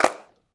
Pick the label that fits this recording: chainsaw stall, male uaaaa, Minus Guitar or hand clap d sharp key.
hand clap d sharp key